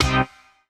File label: C STAB; Loopmasters; Funky Underground Vol 2